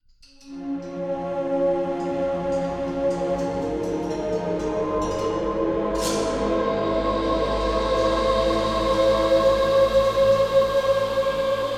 Roulette Music

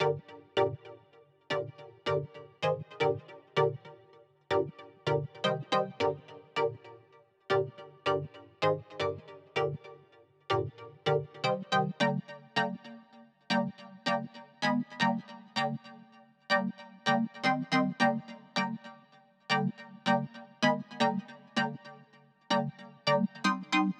Chords; chippy

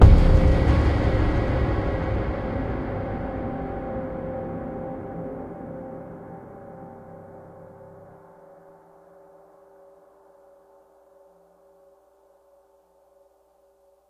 service tunel stinger, Act 1 music; Lotd

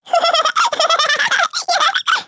Giggle; Mamabugga

